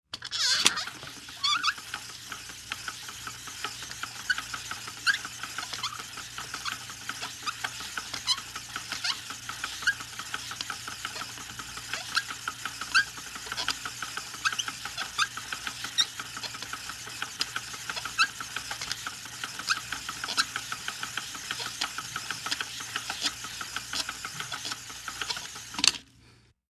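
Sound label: Small Machine Run; machines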